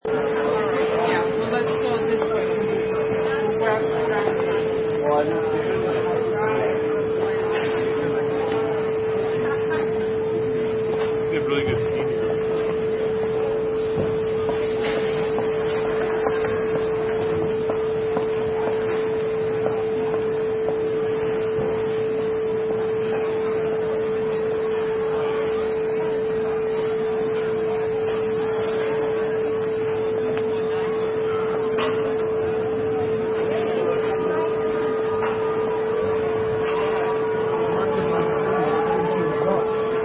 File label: Airport Hallway